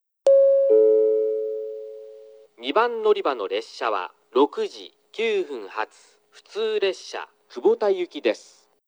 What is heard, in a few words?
balloon